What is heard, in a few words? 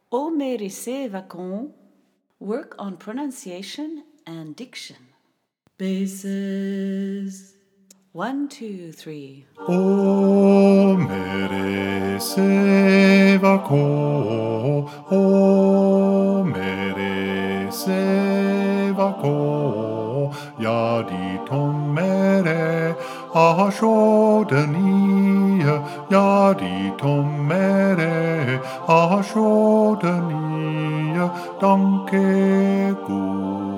bass